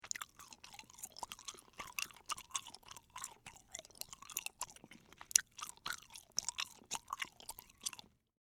Chewing Gum Chew, Human, Chewing, Gum, Chew, Stick; Eating